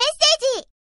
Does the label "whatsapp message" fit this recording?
Yes